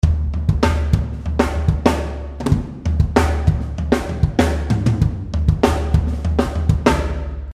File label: tom beat